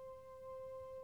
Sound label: Techno leads, hits and riffs